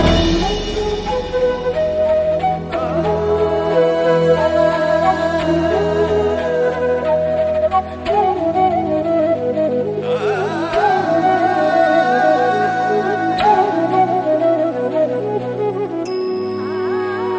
Love Flute Instrumental; krrish 2006

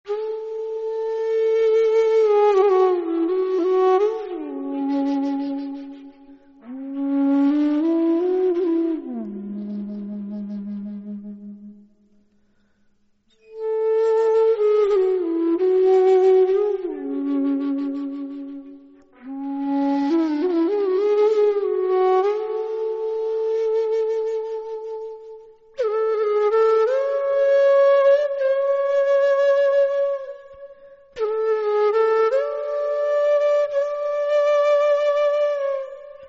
Theme Flute Instrumental; Kisna

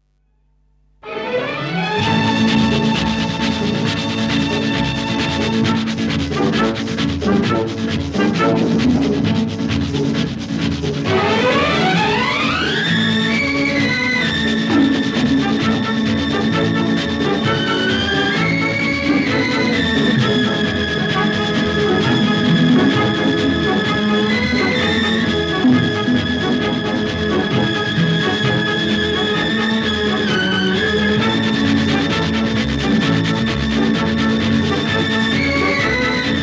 Theme Instrumental; Teesri Manzil

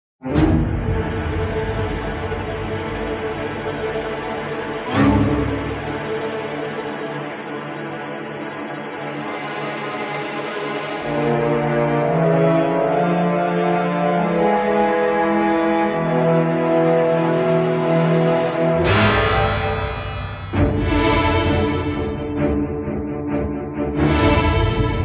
Turbulence Background Theme Music; godmother 1999

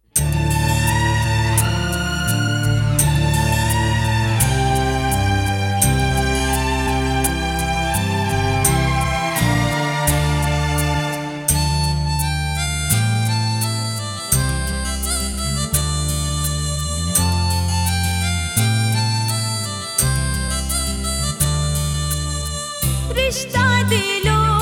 Sad Version; jaanwar 1999

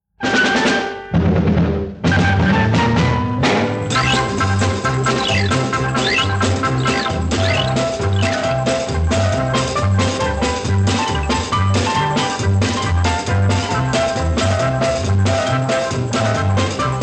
Rangila Ratan Theme Instrumental

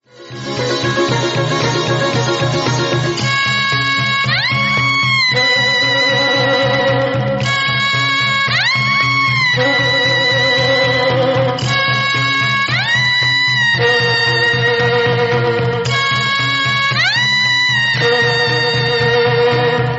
Instrumental

